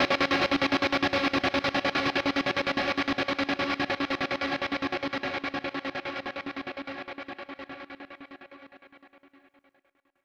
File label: Overlay Dark